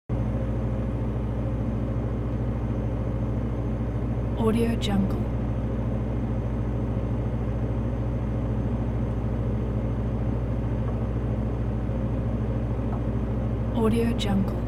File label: refrigerator hum